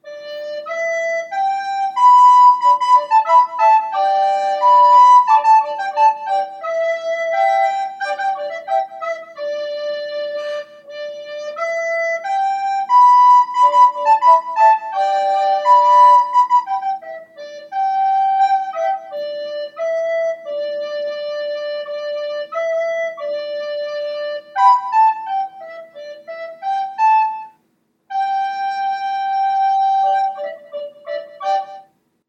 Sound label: American Pentatonic